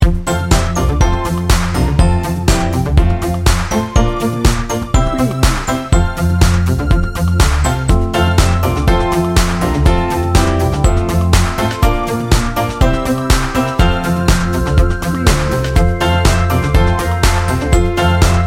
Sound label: joy to the world